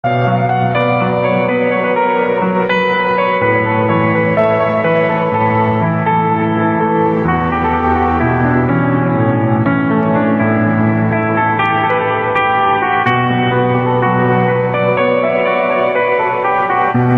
Beautiful Instrument

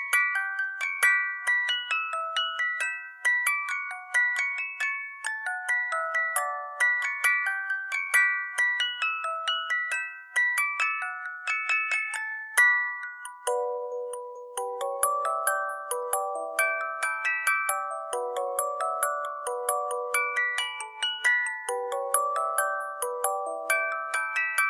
SPLASH

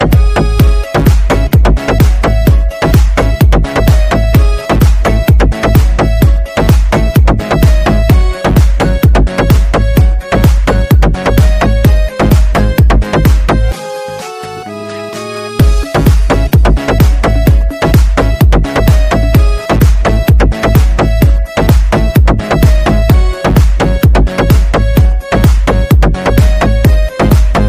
Albanian Summer

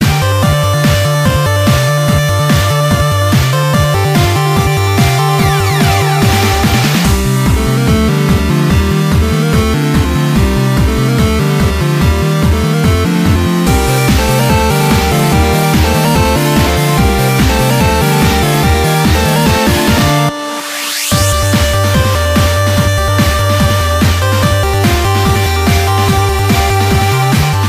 8Bit; Omega; FFXIV

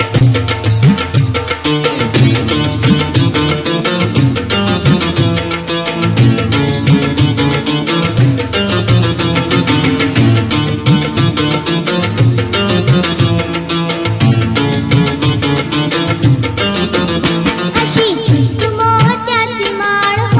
Sweet Marathi Ring

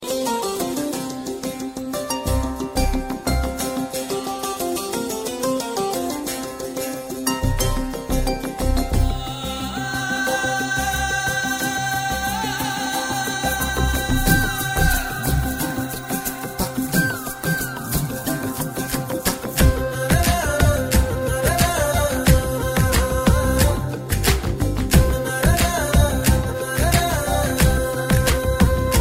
Kerala Musical

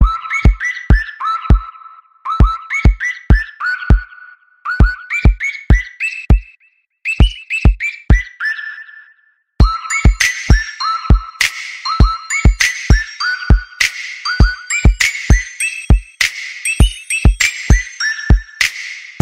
Jingle Bells

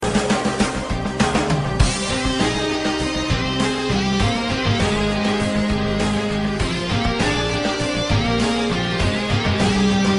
Rock Tune; Ipkknd

